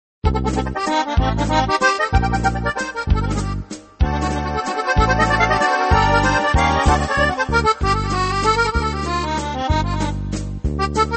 синтезатор, Вальс - мюзет; Ю. Пешков

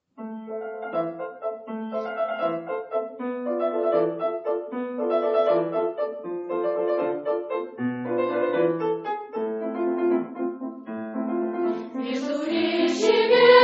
Хор старших классов; Г. Струве; Подмосковье

